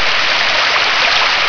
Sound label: water, water sounds